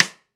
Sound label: Snare Rim, Live Trip Hop Drums; Drum Samples; Smoke Signal